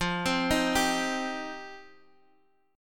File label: C Minor F; arpeggiated; Cm/F